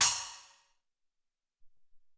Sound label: Splash PP, Alesis SR-18